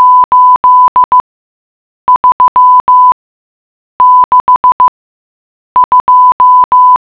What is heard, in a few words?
beeps; encrypted number